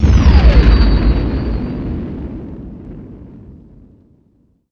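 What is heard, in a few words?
combat explode z95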